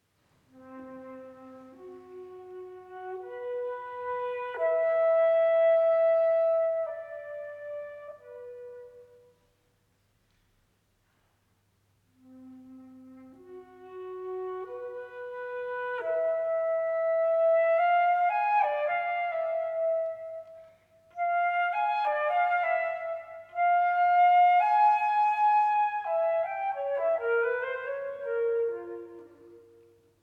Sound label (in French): Danse de la chèvre, flûte traversière; Honegger